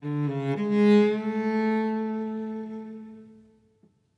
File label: Music Level Argument Stinger; Krish